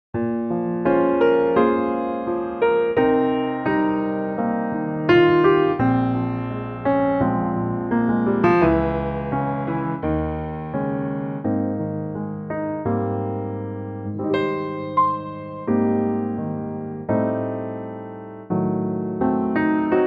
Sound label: piano Ab